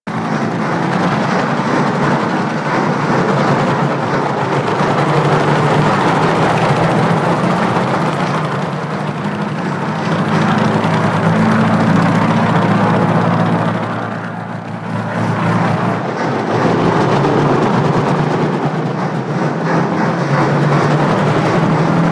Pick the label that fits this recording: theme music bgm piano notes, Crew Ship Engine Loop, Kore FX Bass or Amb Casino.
Crew Ship Engine Loop